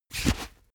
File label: boxing gloves slip in fast; sport freizeit; boxen